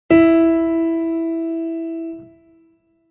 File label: instruments piano mi; maoudamashii